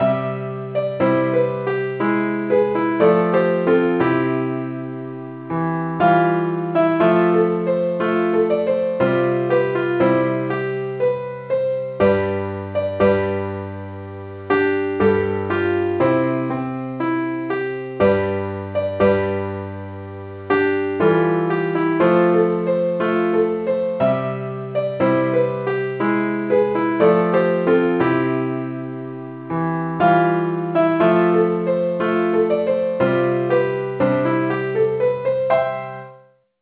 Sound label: keyboard Only key F